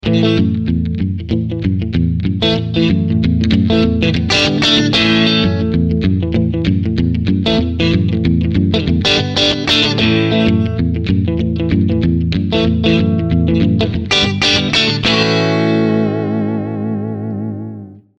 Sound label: strat country twang